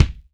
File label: KICK, MG KICK